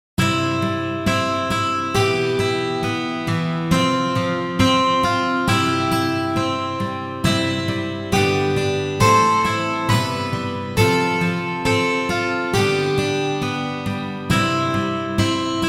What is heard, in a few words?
Guitar; gekiban